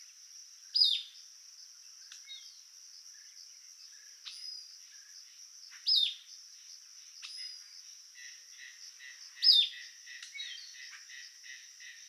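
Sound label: Caribbean Elaenia call; Yucatan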